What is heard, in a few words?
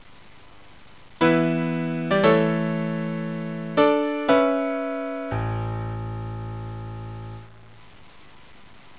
Theme No Click, piano; halo3piano